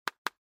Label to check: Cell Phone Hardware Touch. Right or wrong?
Right